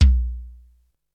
punchy bass drum single shot B key, Royality free bass drum tuned to the B note